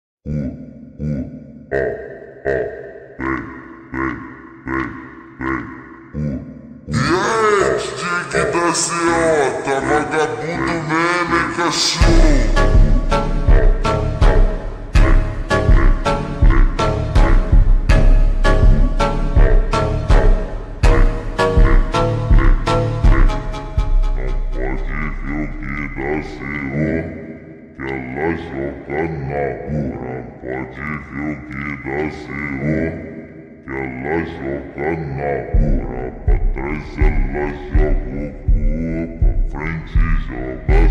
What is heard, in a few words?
ultra slowed reverb